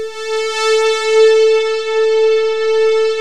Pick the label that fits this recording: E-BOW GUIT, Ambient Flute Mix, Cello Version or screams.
E-BOW GUIT